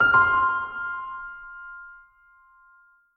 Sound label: piano only; Spa Sonic Brand